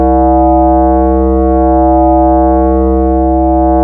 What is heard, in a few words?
pulse ring mod, pads; Zero-G Ambient 1